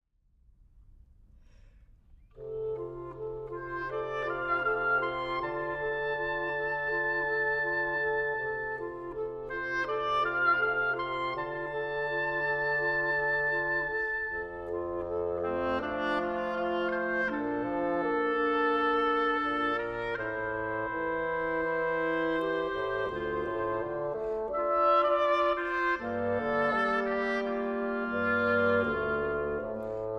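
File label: Woodwind Trio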